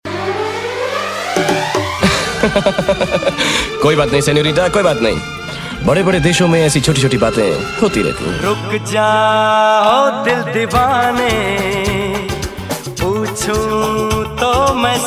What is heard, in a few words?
Mashup Song With iconic Dialogues; Shahrukh Khan